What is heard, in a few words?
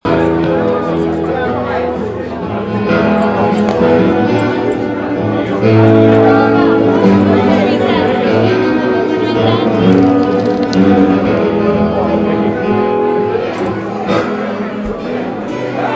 ambient bar noise